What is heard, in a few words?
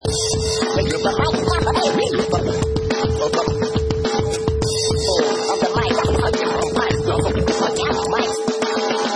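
Scratch Groove 105 BPM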